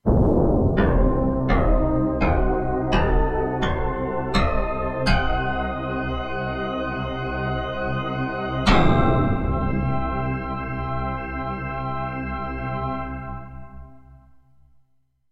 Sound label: Orchestral Wallop